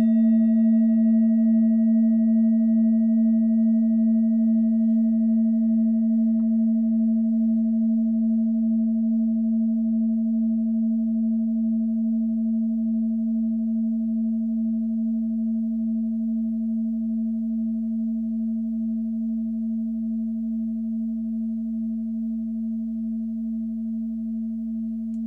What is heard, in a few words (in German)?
klangschale set; Klangschale Nr.3; Gewicht = 1120g; Durchmesser = 18,7cm